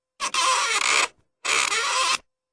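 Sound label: two creaks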